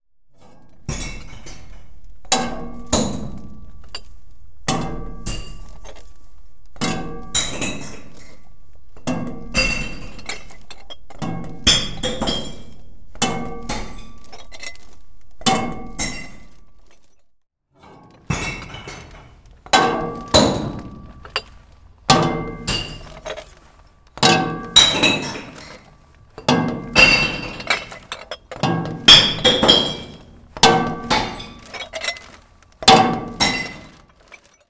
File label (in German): Atmo Vergleich Glascontainer